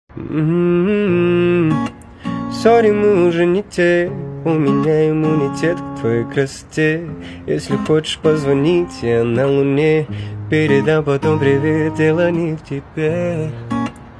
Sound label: Acoustic Live